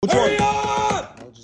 screaming

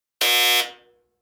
gameshow buzzer

